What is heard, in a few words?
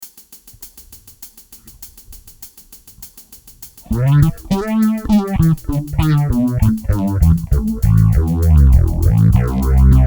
EBS DPHASER AS JET